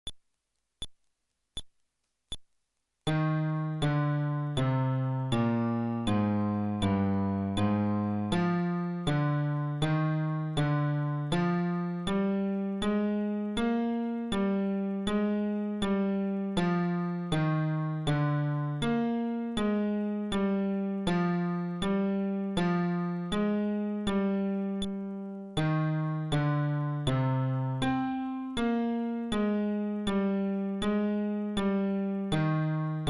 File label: Scale テンポ80, fretless bass